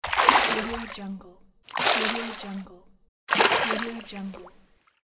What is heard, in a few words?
fish splashing